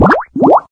cookie bubbles, ringtone

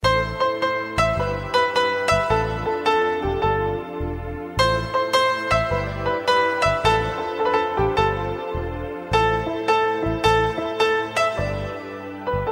romantic piano ringtone

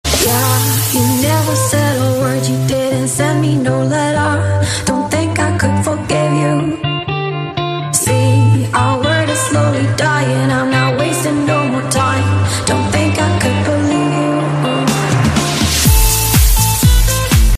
remix ringtone

